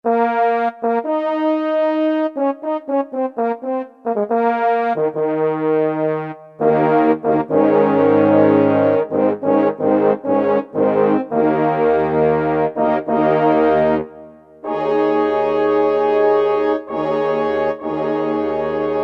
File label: FR.HORN; Orchestral Winds; Roland SN-U110-06